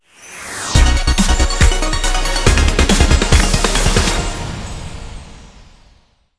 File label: outro Free Spin; jewel fruits